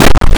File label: gun